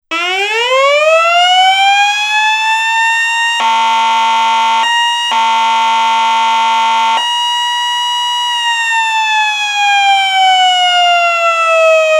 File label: mechanical and horn, siren; sa441M